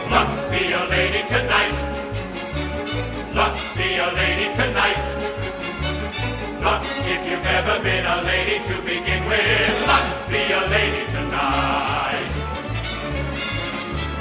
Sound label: Chorus